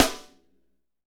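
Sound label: snare, Hip Hop; Northstar - Drumscapes Roland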